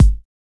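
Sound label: bass drum; E-Lab; X Static Goldmine 1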